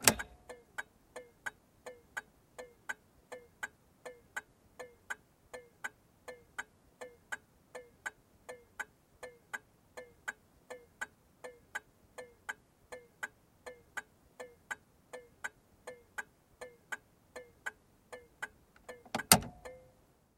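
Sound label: car turn signal sounds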